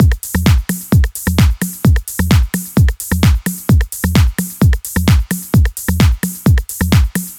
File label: 130 Bpm Classic Techno Drums